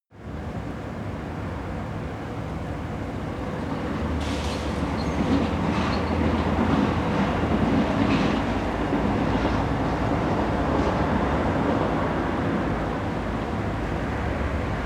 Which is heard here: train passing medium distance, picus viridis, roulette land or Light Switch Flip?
train passing medium distance